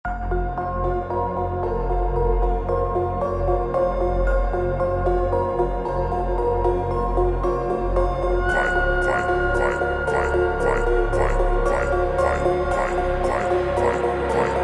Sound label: ultra Slowed